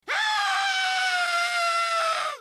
screaming

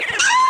high pitched scared scream

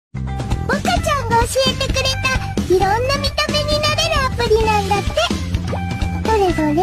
anime mocking, mocking laugh

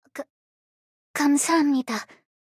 fight

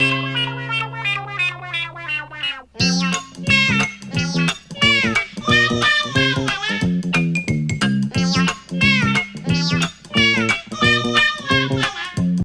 rain frog

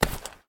pick item; scp

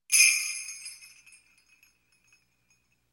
single sleigh bell ring

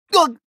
wah

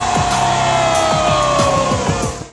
roast ohhh

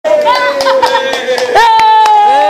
hahahah eeeeeeeeee

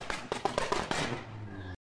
backfire

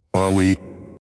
hurt

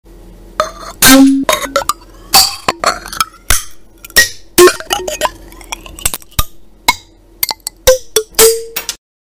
asmr ruler break

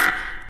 virus error

